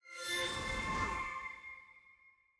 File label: honor level filigree; lol honor